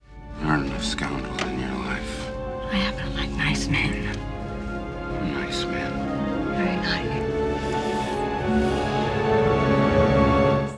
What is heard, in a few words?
Kiss; starwars